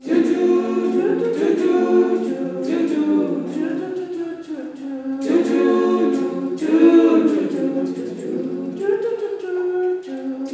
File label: SWAY CHR, FEM. CHOIR; Spectrasonics; Heart of Africa 2